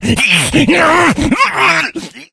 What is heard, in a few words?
become enraged, infected; Left 4 Dead 2; Source Engine